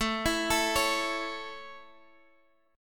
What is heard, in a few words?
A Major A, Guitar Half Step Down